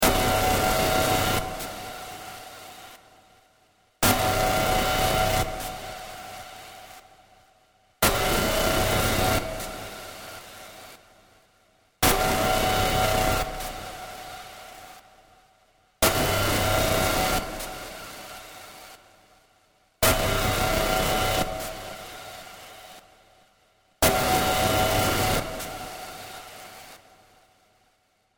Production Line, Hi tech electronics production line looped.; Machinery